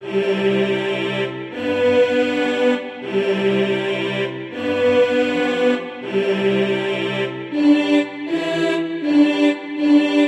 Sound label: tenor